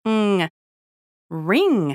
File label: RING